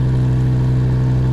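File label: engine exhale, advanced engine sounds; engine2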